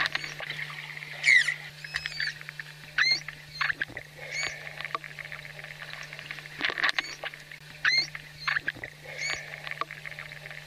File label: trichechus